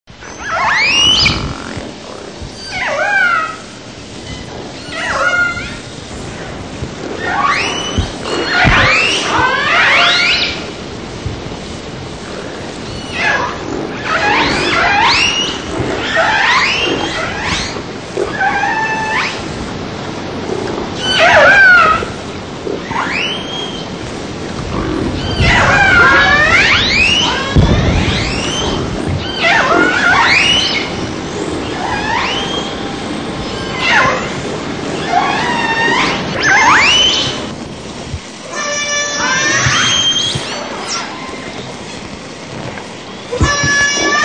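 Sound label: R clan, whales; Killer Whales (Orcinus Orca) are distinctively marked black-and-white dolphins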